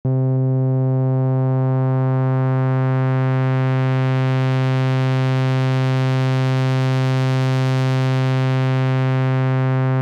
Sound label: control oscillator